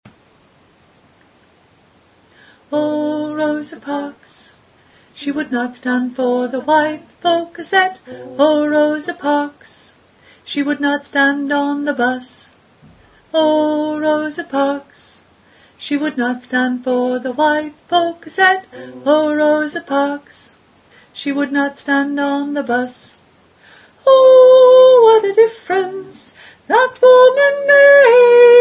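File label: soprano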